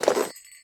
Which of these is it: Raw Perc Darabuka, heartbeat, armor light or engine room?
armor light